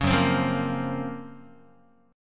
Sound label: F sharp Major Sharp 11th F sharp, Guitar; F#M#11 Chord; strummed; 4,2,3,3,2,x